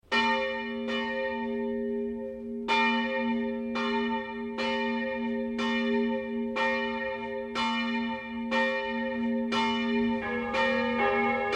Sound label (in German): Simulation Tonart Es